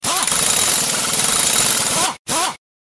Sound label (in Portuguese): furadeira; deathmatch; n3xt inventario